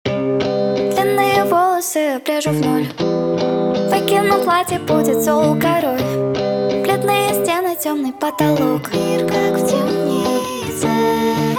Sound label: sped up